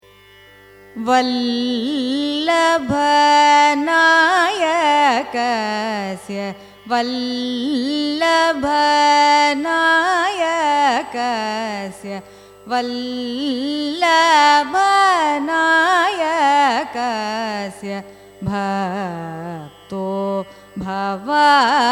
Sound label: raga begada carnatic